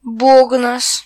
drum; gcompris-data

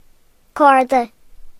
string; gcompris-data

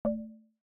terminal Bell; rolisteam

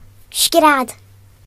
scream; gcompris-data

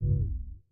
Window Shade Up; Oxygen; plasma

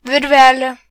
string; gcompris-data

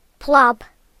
slam; gcompris-data

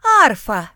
harp; gcompris-data

